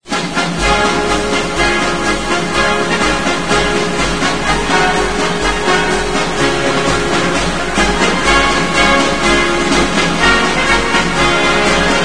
allies score; Source engine

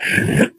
jump; Portal 2; Source Engine; video games; ballbot

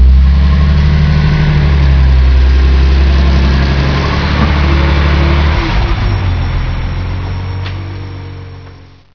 tank drive in, ambience; source engine; hl1; video games

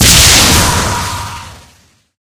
spell; video games; halloween; merasmus; source engine; tf

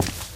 walk tile, footsteps

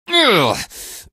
pain, barney; hl2; Source engine

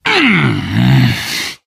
hurt major, biker; source engine; left 4 dead 2

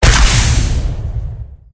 flare gun; tf

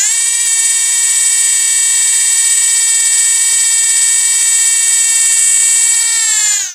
teleporter build open; tf

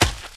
grass, footsteps, infected, walk

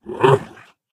ballbot impressive

